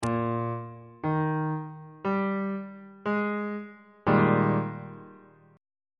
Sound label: g sharp major 7th suspended 2nd 1st inversion on bass clef